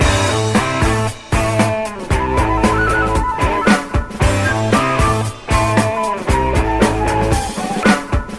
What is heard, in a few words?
full, Loops Phonky